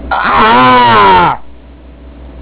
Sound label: scream; thesis